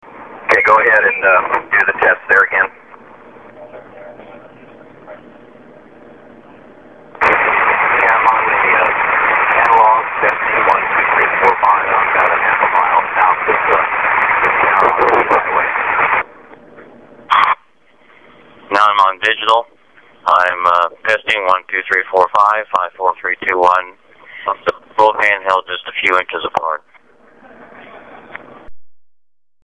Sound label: Analog MotoTRBO